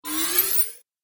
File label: Arcade Game Powering Up; Video Game Sound Effects; Retro Inspired Sound Effects - Think Nintendo, Atari, C64